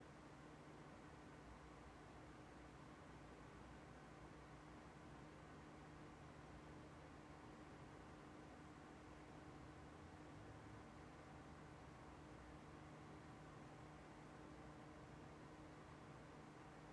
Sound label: pwm; Arctic P12 PWM; Portable Air Purifier; DIY Tutorial: Build a Portable Air Purifier